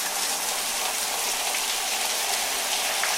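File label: noise of water from the shower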